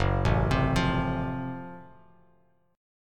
F sharp Minor Major 7th Flat 5th F sharp down Bass Standard; arpeggiated